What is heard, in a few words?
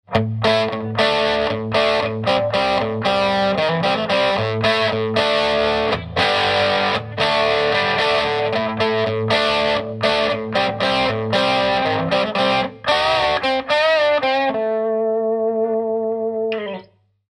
hiwatt c50 combo, amps